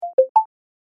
Phone Tri-Tone; Nook

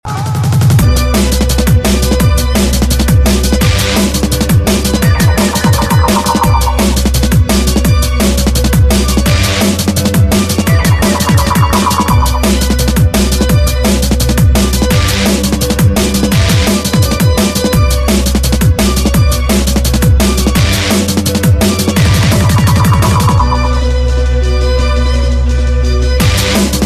Drum N Bass

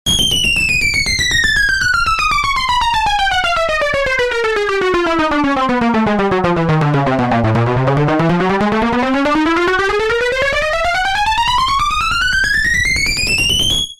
Descent Tone

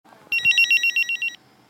Motorola Pager

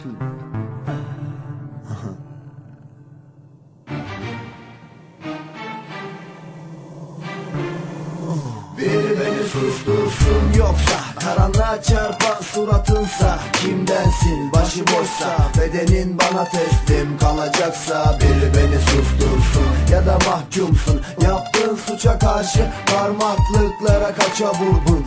Turkish Rap

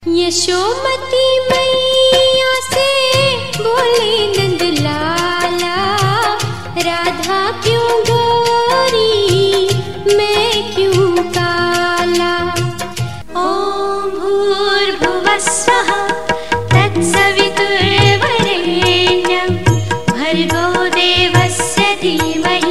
Mix Bhajan

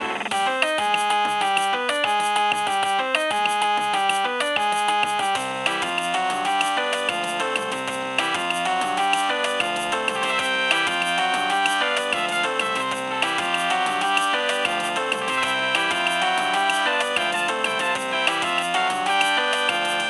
Salsa

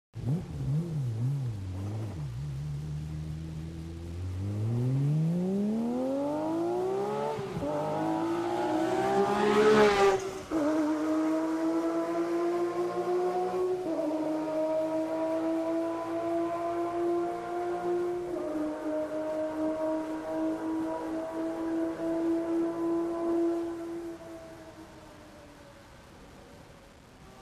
Porsche

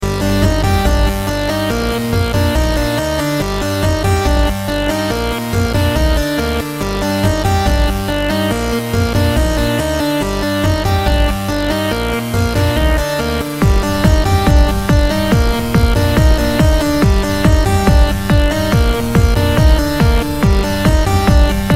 Nice Tranc

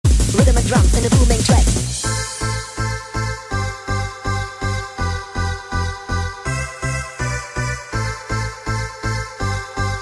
rythem and drums

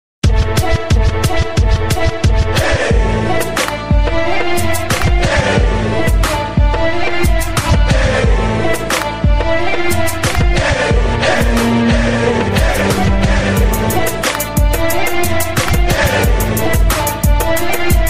Arabic Beat

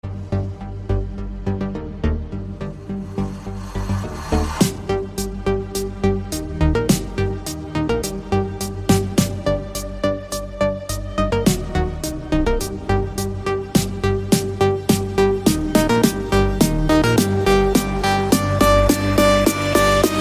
Electronic star

